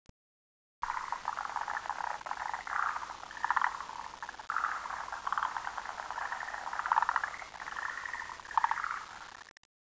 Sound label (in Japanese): nakigoe, moriamagaeru